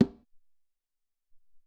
Cong Lo

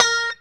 POP A#4, STRAT guitar; Spectrasonics; Hans Zimmer Guitars VOL-1; JC-STRAT POP

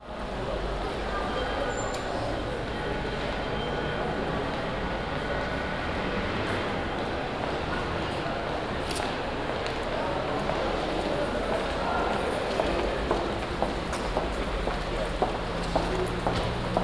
OUT DOME, Cathedral Organ, OUTSIDE DOME; Propeller Island

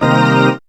organ c, Garage Organ; Synths

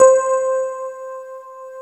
digi piano; DCS KEYS

